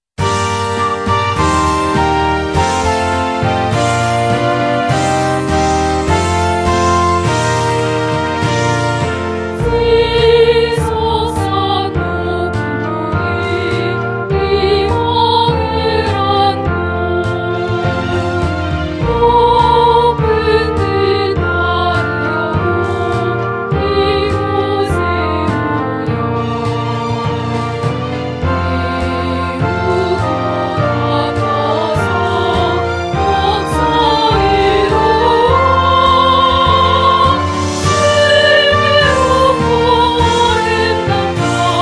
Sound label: song